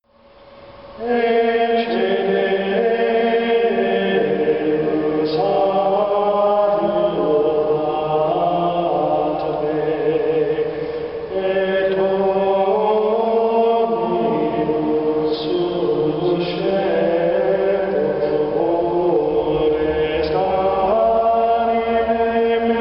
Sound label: ecce deus gregorian chant choral; gregorianski